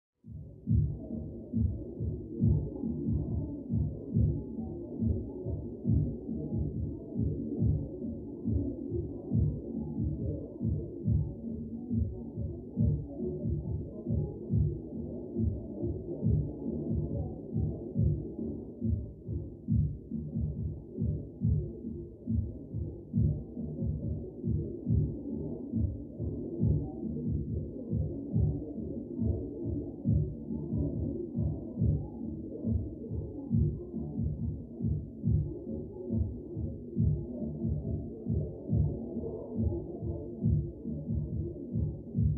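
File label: Party Busy Adult Walla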